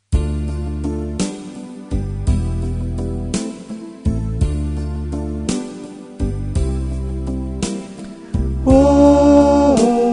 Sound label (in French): noel a des yeux de fete alti